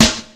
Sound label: Studio Snare D Key; snare drum samples